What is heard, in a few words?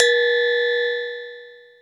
T METAL WAV HI, DRUM FAMILY2; Back In Time Records; Korg Universe VOL-1